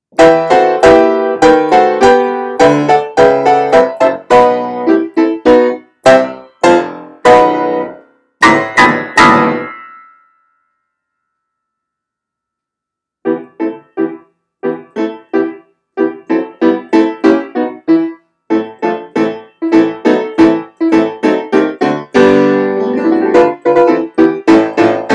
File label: improv E min, piano improvs; a traditional Simchat Torah tune as a children’s Purim song; Noam D. Elkies piano improvisations